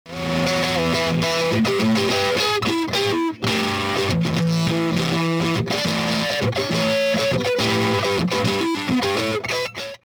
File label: boss bd2, effector